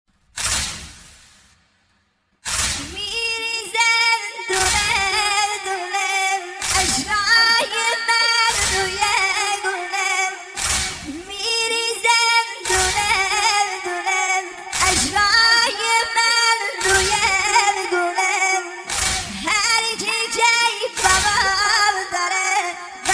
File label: nohe sedaye nojavan